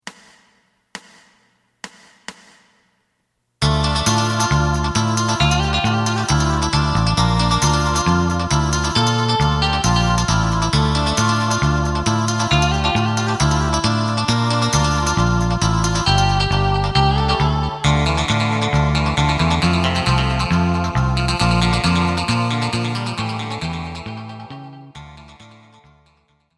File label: drum